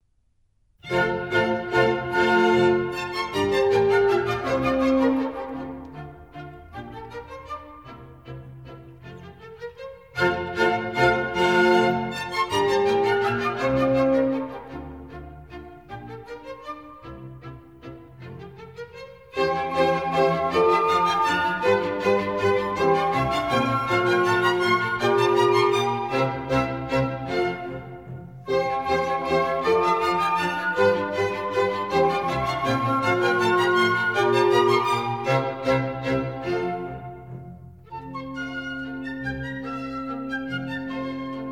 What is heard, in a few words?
German Dances, F Major; Mozart; Dances and Marches